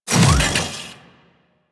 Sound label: Mech Melee Swing Start; Nechro